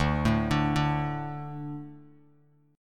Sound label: D 5th D, down, Bass Standard; D5 arpeggiated; JGuitar